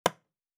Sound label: Wood Chop; Survival Game Sounds - Lite Edition; Crafting